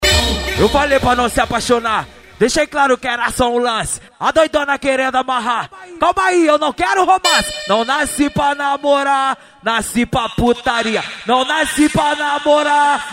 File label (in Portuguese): funk ritmado; especial cuiaba